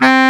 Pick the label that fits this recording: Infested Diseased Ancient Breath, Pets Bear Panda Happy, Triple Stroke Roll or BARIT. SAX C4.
BARIT. SAX C4